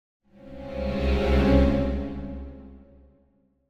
Symphonic Hit Bass Strings Calls - Increasing Chord Type D; Hollywood Edge; sound designer's toolkit v2